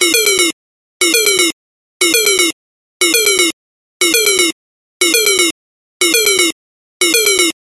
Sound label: science fiction alarm loop